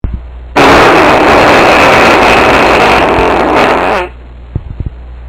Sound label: fart